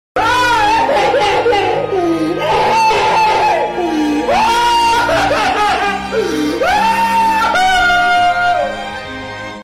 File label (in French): pleure